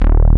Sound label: Mini Saw D#0; Digital Sound Factory Xtreme Lead